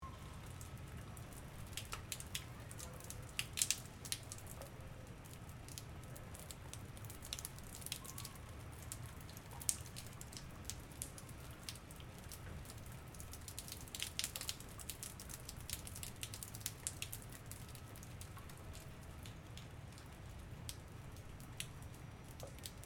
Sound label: RAIN WATER DRIPPING AFTER RAIN STEREO; Weather